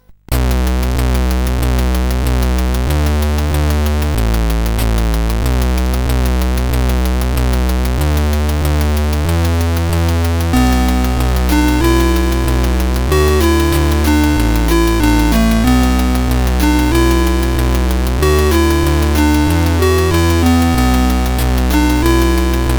First Shower tune,sid CSG8580R5

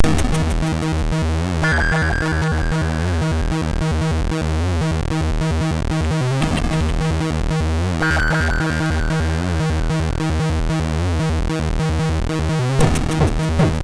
Applause II, MOS6581R3

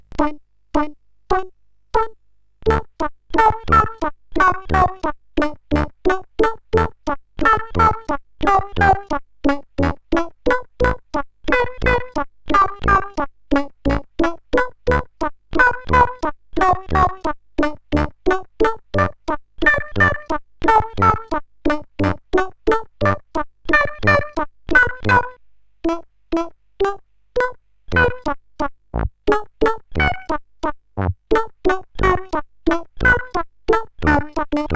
sid, CSG8580R5

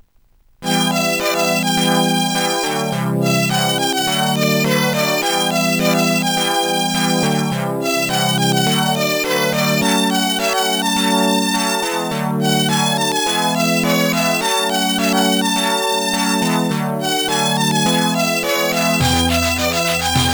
chor; Sonix Music Driver

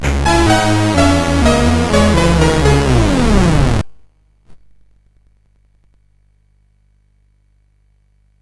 sid CSG8580R5; Astrobot II

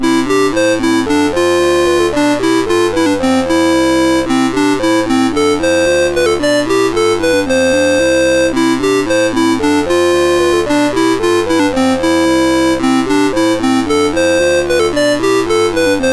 Happy Birthday, MOS6581R3; Happy Birthday Q-Link

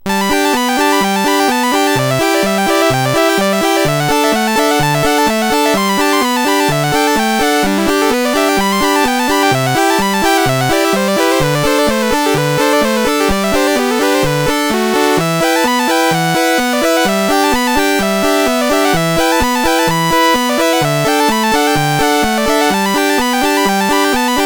Hacksville Hoedown BASIC sid CSG8580R5

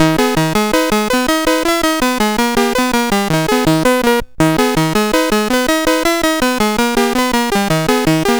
Big Ben, CSG8580R5; GAMES

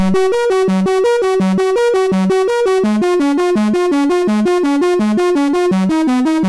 sid MOS6581R3; Escape from the Waste Disposal Unit

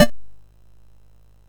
sid MOS6581R2; Popeye

